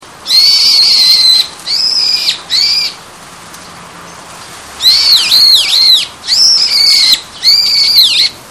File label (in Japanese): hiyodori